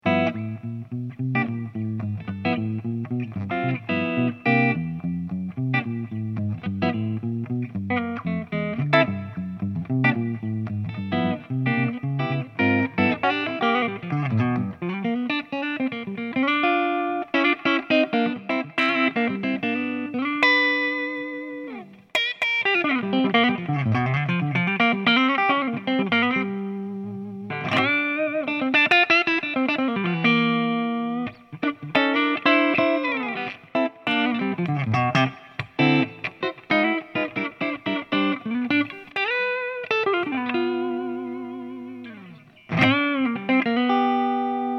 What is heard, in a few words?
Neck Clean